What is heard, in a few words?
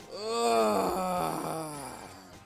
uhhahhghh